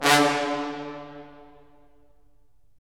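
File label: brass cimb, Roland; Roland LA Composer vol. 4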